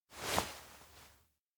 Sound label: point sky; awayuki; anemoi